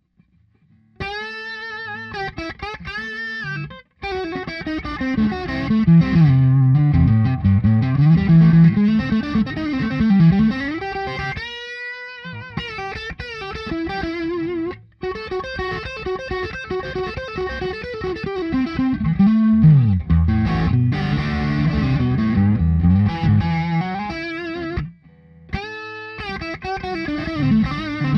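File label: Neck Pup, guitar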